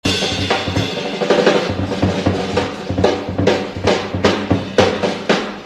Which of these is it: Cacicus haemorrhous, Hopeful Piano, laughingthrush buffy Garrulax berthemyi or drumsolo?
drumsolo